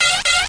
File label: damage; lander